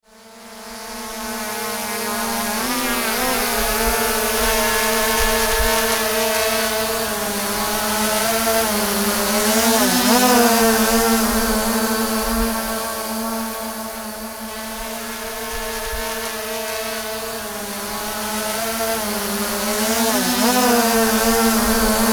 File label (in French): drone, abeilles